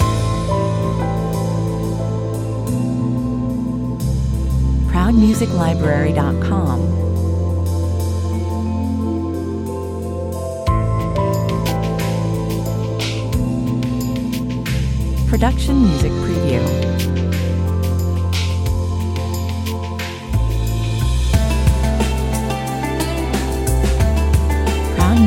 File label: Calm chill out song with upright bass, acoustic drums, e-guitar, percussion and soft piano tun; 90 bpm; Calm chill out song with upright bass, acoustic drums, e-guitar, percussion and soft piano tunes, embedded in floating pads. Unobstrusive relaxing music